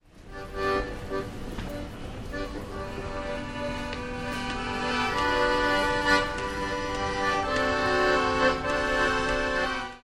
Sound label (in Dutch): Accordeon